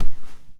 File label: padded footsteps; zps; Foley